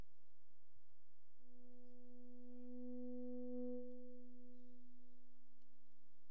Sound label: Feedback